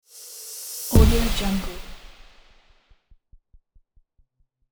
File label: thin sweep impact